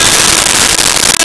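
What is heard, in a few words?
Steel String Guitar E5; Nintendo; July 2020 Gigaleak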